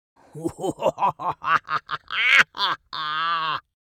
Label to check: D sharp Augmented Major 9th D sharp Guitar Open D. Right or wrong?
Wrong